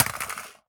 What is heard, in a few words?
phys mag drop ar metal concrete, magazine drops